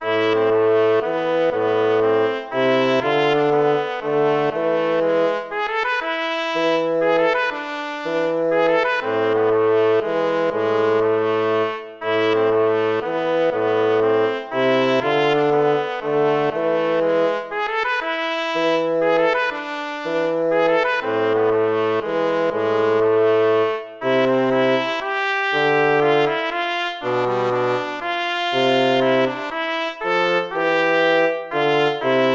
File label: baroque minuet minor